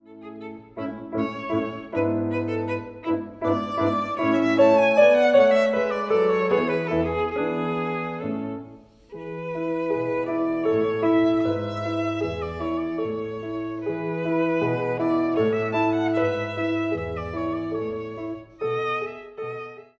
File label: VIOLIN; Trinity College London violin exams for 2016-2019; Mozart: Polonaise K.487